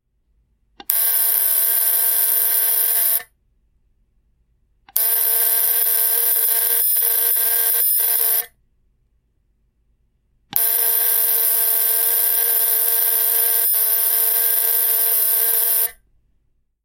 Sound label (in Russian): tri dlinnih vspleska zvuka zummera zapisannie vblizi ustrojstva signal electric